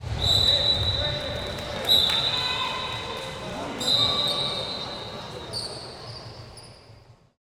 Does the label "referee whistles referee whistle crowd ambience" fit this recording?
Yes